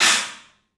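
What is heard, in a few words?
CLUB CYMBALS; 3D Sound; 3D Drums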